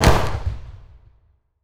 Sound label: drone Damage